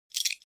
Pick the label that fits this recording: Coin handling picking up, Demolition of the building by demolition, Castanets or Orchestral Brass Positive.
Coin handling picking up